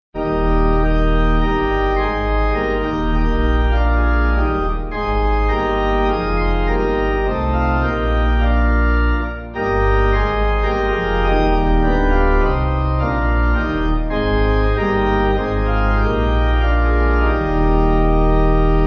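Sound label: Pipe Organ, Music Backing Files for Churches; Now Let Us See; Kilmarnock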